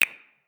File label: tick